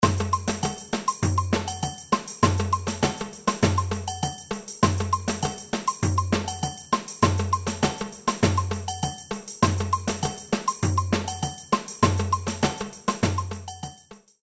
ensemble

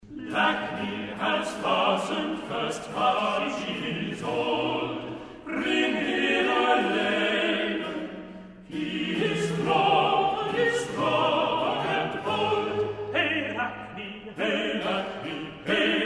Choeur des chasseurs; Lachne has fast ned first

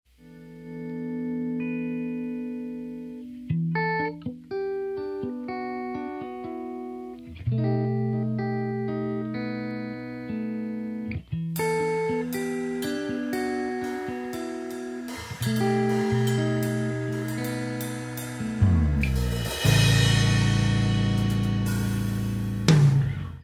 guitare batterie

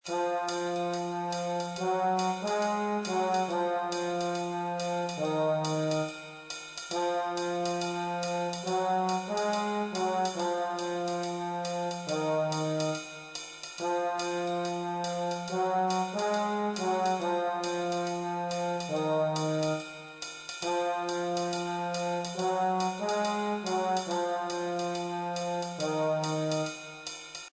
trombone voix

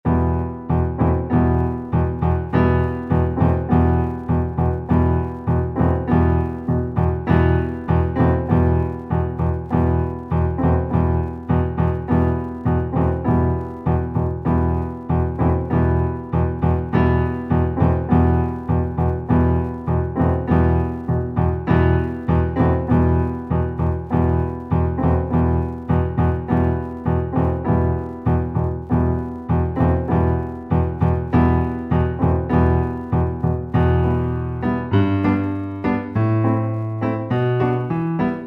accompagnement; la jument de michao

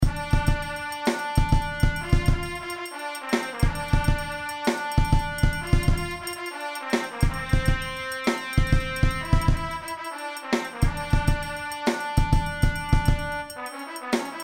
Trompette

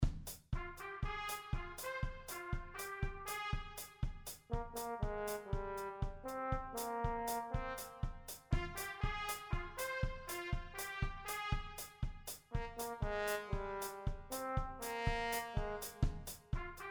trompette trombone

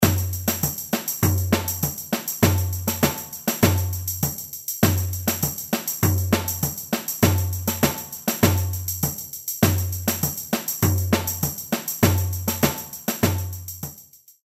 caisse sourdo shaker

